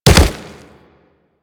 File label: Kubrow Land Dirt, Footsteps; Enemies; Warframe